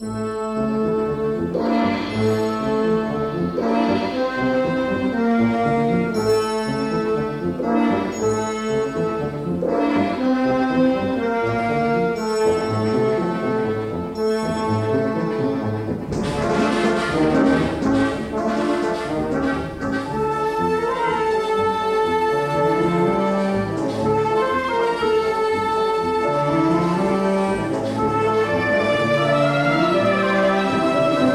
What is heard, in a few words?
Paso Doble; Torero Arte y Pasion en la Arena